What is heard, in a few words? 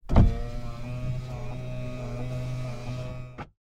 Car Window Open